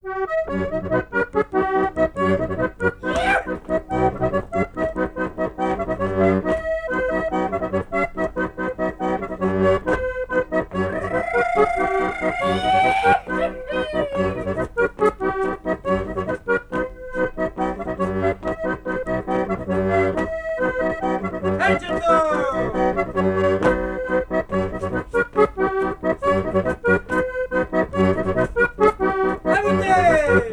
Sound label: alto